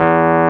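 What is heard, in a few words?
WURLY NO, Wurlitzer electric piano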